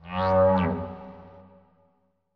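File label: Animal Stinger Eerie; stingers & whooshes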